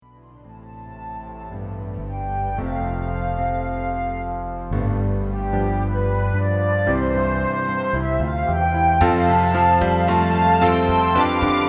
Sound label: Instrumental